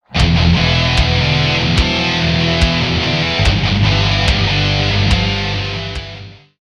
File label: Stage Stinger Murloc